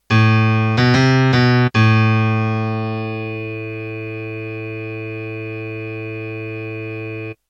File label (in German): Korg KROSS 2 internes Sample Looping